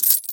gold up; skyrim

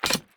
weapon down; skyrim

